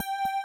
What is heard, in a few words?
GTR BASS; Pro-Rec - Synthsonic; Z1 DANCE